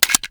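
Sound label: pistol reload fast xmag stock mag out; gmod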